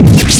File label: Hit Sword